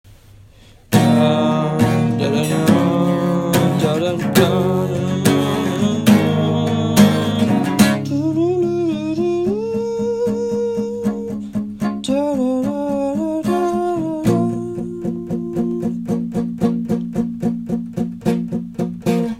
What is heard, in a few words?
capo sad still but more